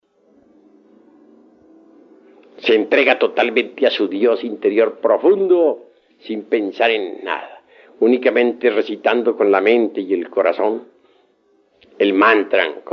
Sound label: gate gate, mantram